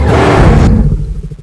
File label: hide skill start; cstrike; ZombieDarkness; hidden